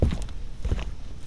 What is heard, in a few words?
Footsteps on wood; creatures; sasquatch